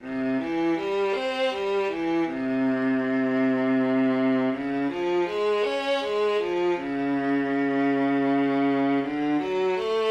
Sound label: Arpeggios - Low, music warmups; silverchord